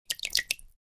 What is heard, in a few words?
Liquid hands in water tiny movements